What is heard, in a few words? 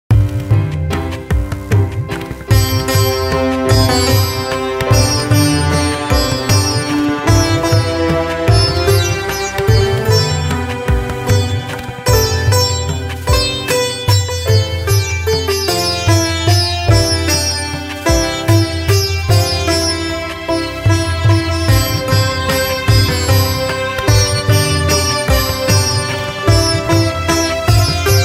rabindra sangeet ringtone instrumental